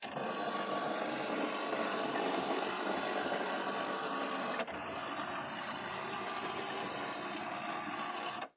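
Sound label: sound of scanner working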